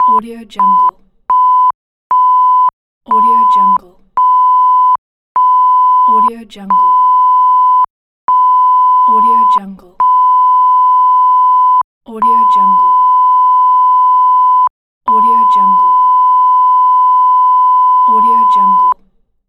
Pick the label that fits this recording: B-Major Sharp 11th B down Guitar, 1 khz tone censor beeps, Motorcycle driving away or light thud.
1 khz tone censor beeps